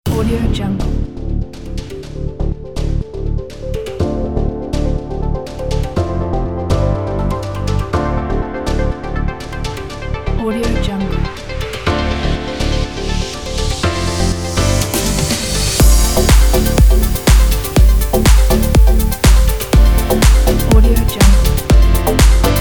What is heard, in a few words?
deep house